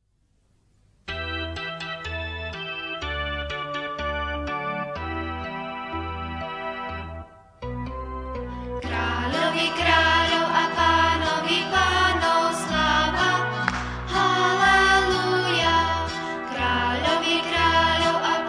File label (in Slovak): Slovak Piesne Pre Deti